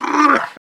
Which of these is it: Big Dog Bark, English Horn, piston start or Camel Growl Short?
Camel Growl Short